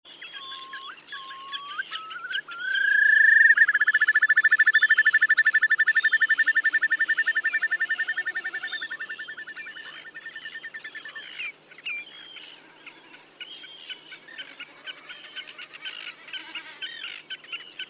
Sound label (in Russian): sredniy kronshnep golos